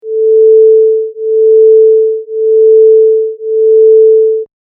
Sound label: 440−441hz